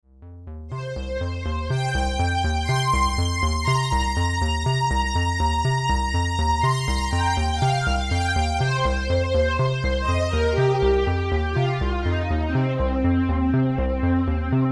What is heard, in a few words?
Resonators